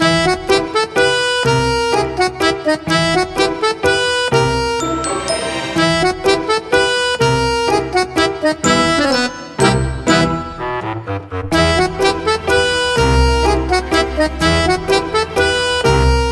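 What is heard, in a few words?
Wacky Witness loop, BGM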